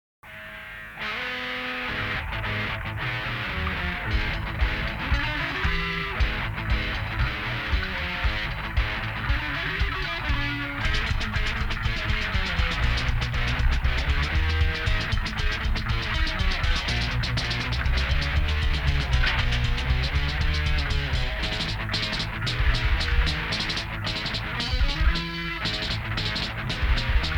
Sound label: instrumental